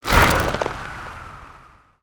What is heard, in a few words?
Ice Shuriken Throw; Spinjitsu